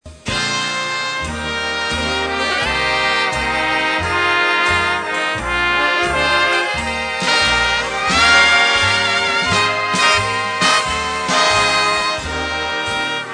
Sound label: Auld Lang Syne Orchestra; xmas classics